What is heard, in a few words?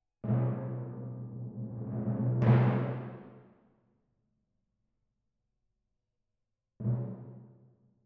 Timp